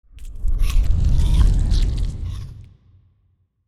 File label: big legs swing up; biogenesis